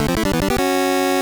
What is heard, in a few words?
Stereo 1up